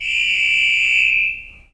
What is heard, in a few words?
basketball buzzer; sports